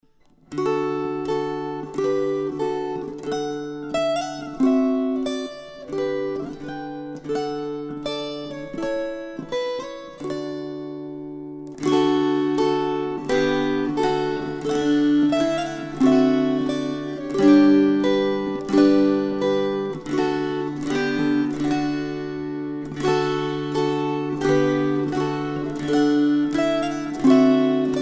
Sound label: String Choir